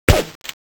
Gun; mahouteq

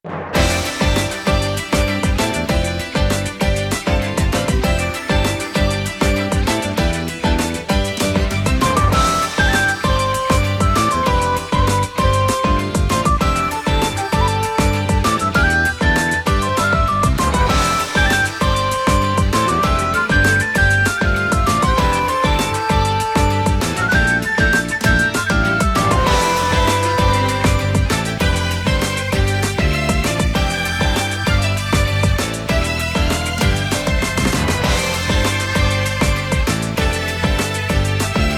Battle

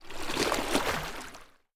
Water Splash Soft

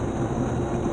SFX - TRUCKT04; Jeff Wayne's The War of the Worlds

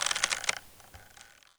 Rope Creak

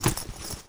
Backpack

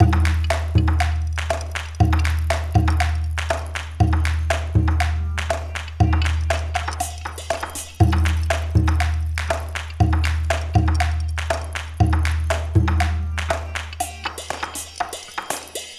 Floorjam; Rabbittrouble; Mushroom Men: The Spore Wars; Wii

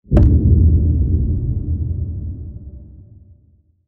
Game Loading; Nightmare Files Clap Clap

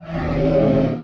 Monster Dead; 101-in-1 Explosive Megamix; WiiWare

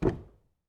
Crate Impact; PEAK (2025)

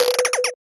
Miss Turn; uno

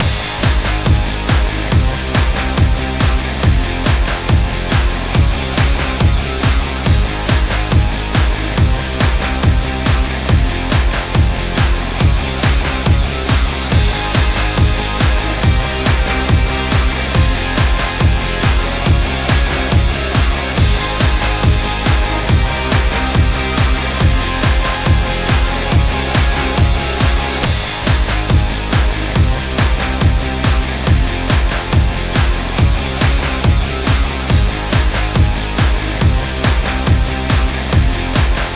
Instrumental Snippet; Victorious: Taking the Lead

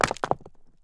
Stone hit

